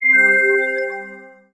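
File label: powerup storm, alert descending; Audiosurf; chillout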